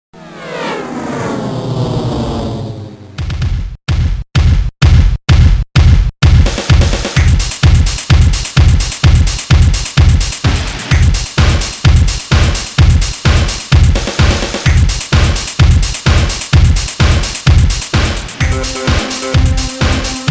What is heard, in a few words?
MODs Techno; retro